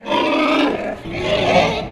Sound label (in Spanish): CERDO CHILLA; yesterday origins gamerip 2016 switch ps4 pc ios macos